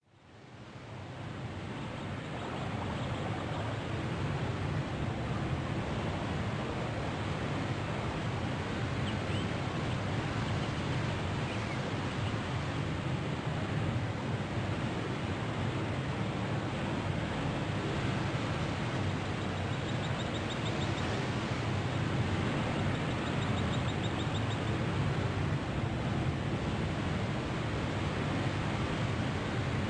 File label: Nature; Skin Picking